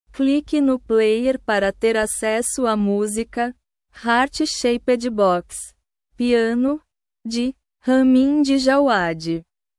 piano

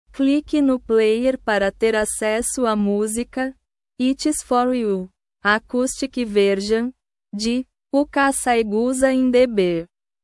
acoustic version

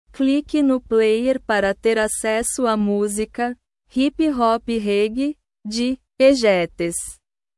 hip hop reggae

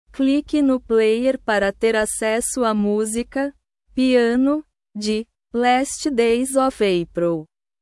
piano

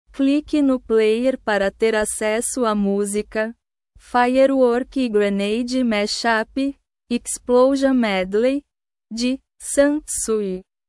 firework grenade mashup explosion medley

